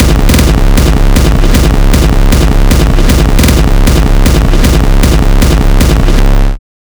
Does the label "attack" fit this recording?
No